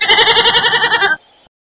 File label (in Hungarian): kecske